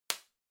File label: Bubble Wrap (Pop Distant); 気泡緩衝材; プチッ 遠い; 梱包材